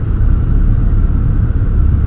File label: doom terminal; doomgiver